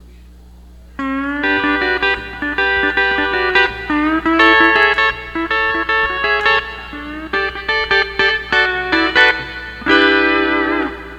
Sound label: 12 String Lap